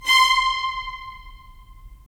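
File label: strings violins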